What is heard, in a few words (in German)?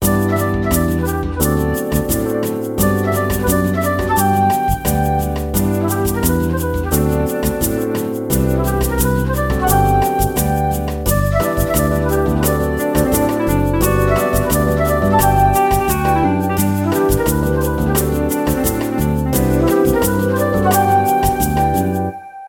podcast intro melodie